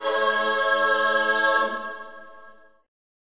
Slavic Choir A Oooh; Slavic Maybe Bulgarian Choir; VOCAL

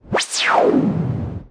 noise Modular, Noise Sweeps

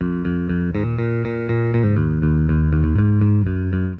bass; MELODIC SAMPLES

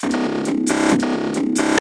Electro FX; Ueb HE Electro FX

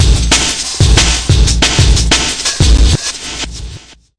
sizzle, drum loops and breaks; 181 bpm plus

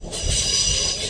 subway sound; UNUSUAL KITS and FX; Subway Sound Construction Kit

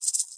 rattle, ethnic and world percussion; Rattles

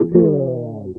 fret me down, guitar

